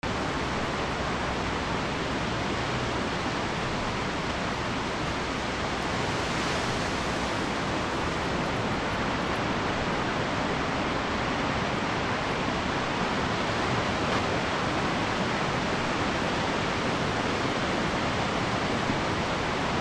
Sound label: early morning surf; pismo